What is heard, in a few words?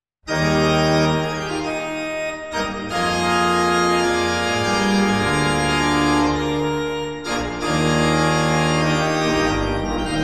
Prelude and Fugue in E flat major; Bach